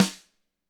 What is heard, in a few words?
GRCH HI, GRETSCH SNR; ILIO; Double Platinum Drums 1